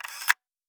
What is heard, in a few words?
Camera Sound